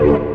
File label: deadsoul damage